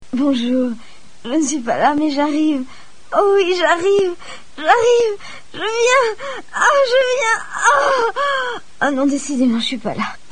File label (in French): ORGASME; repondeur